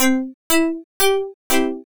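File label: electric piano 2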